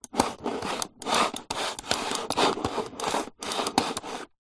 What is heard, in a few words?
technology computer mouse old plastic slides clicks movements wood table